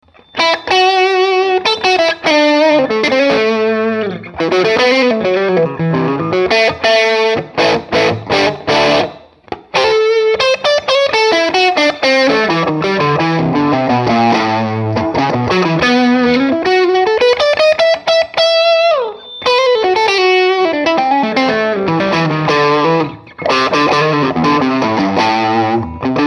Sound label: wide range neck bridge dirty; lollar